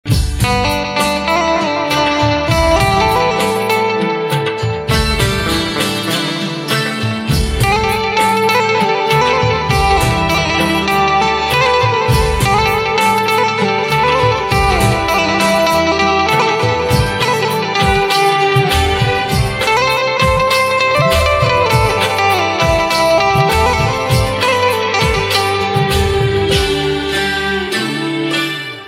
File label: Banjo Instrumental Ringtone